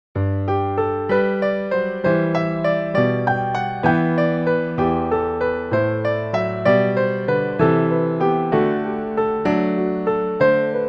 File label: Jesu Joy of Our Desiring J.S. Bach wedding processional, jazz piano